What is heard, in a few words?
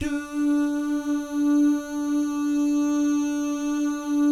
"DOO" GUYS, Vocal Jazz Fx; Spectrasonics; Vocal Planet Vol.3 Jazz Fx